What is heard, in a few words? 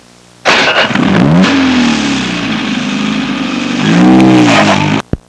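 SPORTS CARS; trasporti; auto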